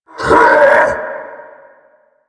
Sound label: Heavy Laugh; Warframe; Dax